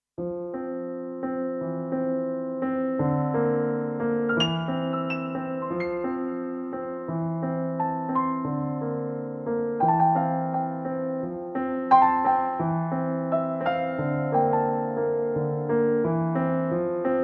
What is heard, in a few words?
Piano Version